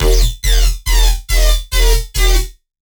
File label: Bass E, Dubstep; Bass One Shots; Ghosthack; Dubstep Sound Arsenal